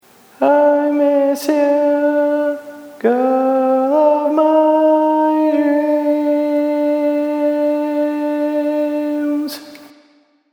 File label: Tenor, barbershop tag